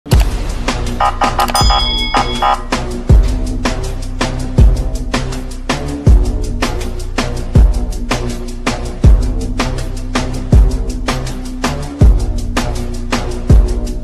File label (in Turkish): polis sireni